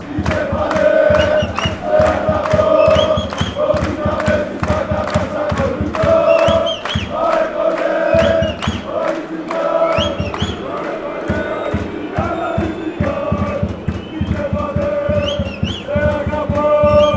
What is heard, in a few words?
chant, fanchants; aek athens